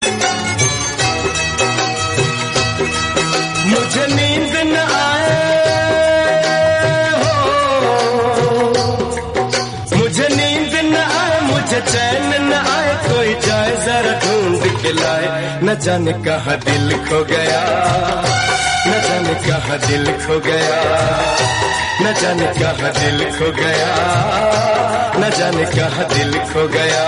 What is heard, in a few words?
ringtone